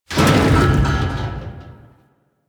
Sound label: Submerged Metal Barrel; Ambience; One Offs; Warframe; Quests; The New War; Drifter Camp